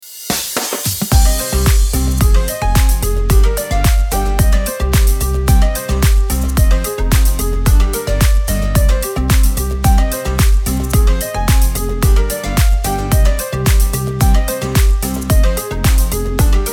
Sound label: background music tropical house funny dance music